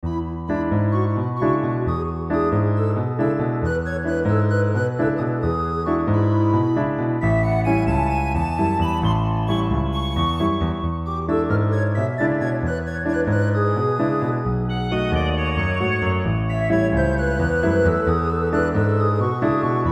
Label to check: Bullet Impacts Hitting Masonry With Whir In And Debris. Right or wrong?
Wrong